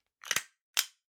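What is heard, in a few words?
stapler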